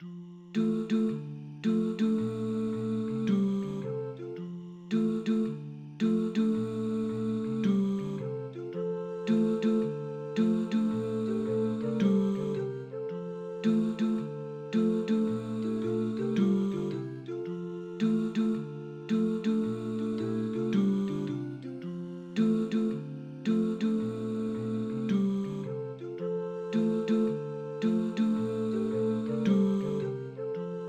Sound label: Tenors